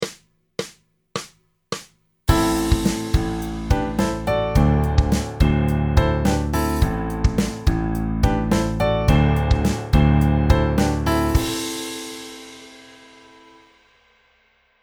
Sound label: Rock ii V example